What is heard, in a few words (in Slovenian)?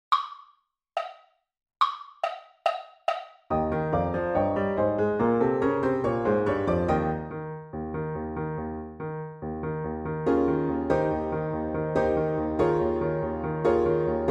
piano, ksilofon s klavirjem